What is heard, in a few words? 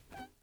glass jump